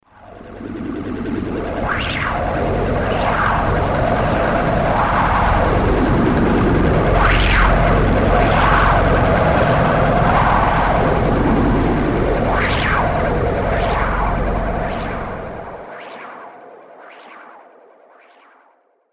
Extra Terrestrial Take Over, science fiction sound effect; star wars / trek style games; Andrew Fleming